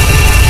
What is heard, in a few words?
laser sentry shoot